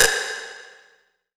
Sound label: Ambience, Hip Hop; Akai MPC500